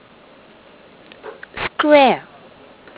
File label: Square; Aibo; ers210